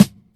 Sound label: dope steel snare drum sound g sharp